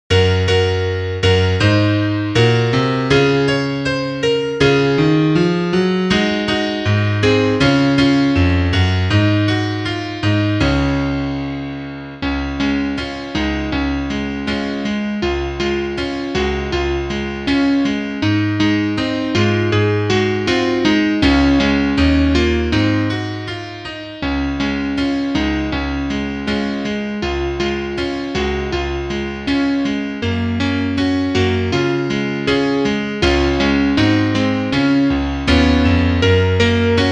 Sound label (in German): Klavier Solo, trompete; Surprise